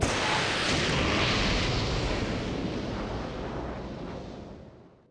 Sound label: Booster Ext